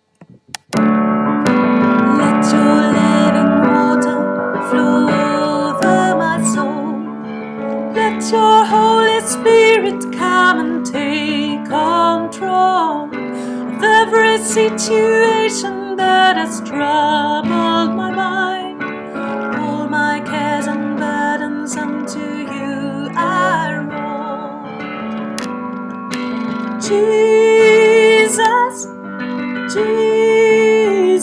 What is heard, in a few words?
let your living soprano